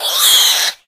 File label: tushkano pain